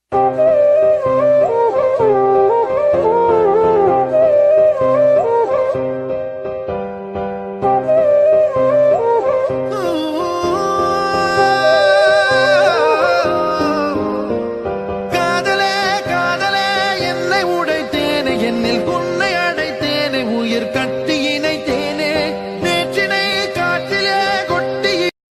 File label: love bgm ringtone; Indru Netru Naalai